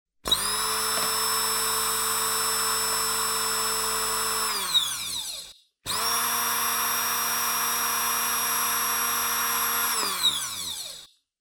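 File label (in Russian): звук блендера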